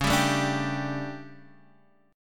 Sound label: D Major 7th Suspended 4th, chord